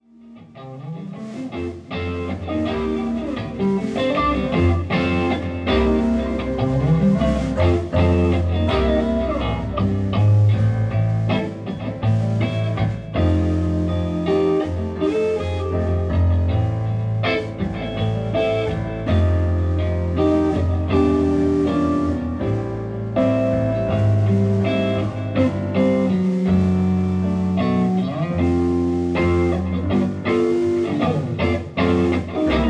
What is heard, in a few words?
blues